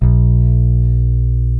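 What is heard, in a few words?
bass guitar A3, jazz bass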